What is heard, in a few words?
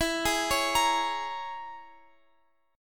E 6th Flat 5th, down, Guitar, Open F; E6b5 arpeggiated; JGuitar